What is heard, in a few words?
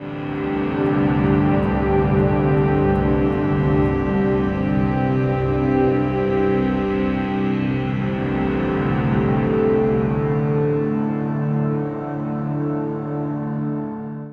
Elektro Mass, Elektrik Drone, Sky Church